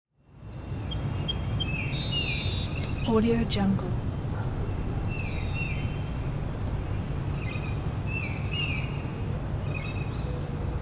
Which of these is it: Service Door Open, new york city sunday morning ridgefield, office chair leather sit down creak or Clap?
new york city sunday morning ridgefield